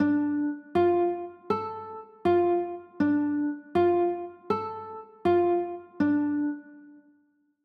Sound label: d m, chords